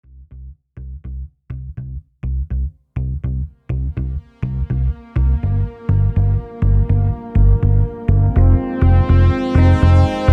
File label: blues